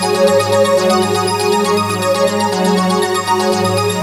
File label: (arp) single chord; Sonic Foundry (Sony Creative Software); Ugly Remnants VOL-2